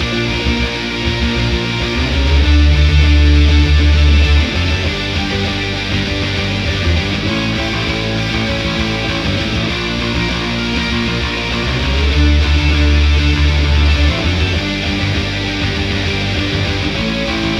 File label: Rock